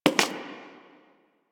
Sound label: Human Loid Foley Step; Warframe